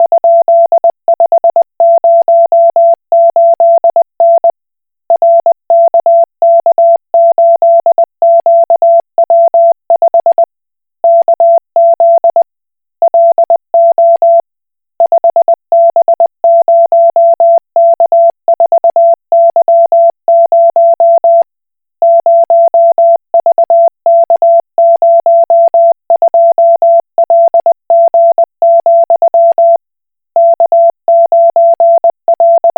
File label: Koch Morse